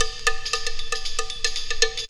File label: perc 116, E perc loops